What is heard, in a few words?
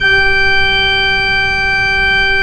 PRINC G5, organ; AKAI S-Series CD-ROM Sound Library VOL-2